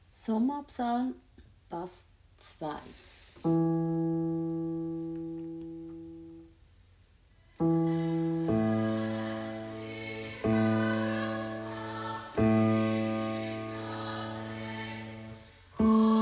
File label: Sommarpsalm Bass